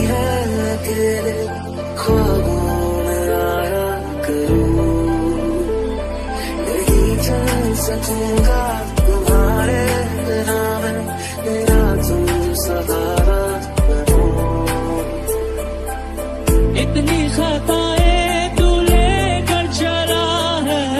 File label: bollywood music ringtone